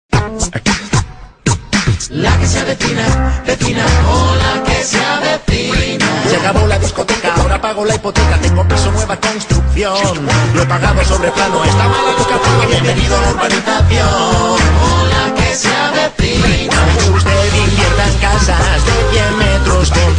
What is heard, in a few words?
song